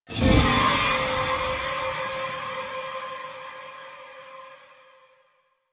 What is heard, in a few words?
Purgatory Ghost Spawn; Warframe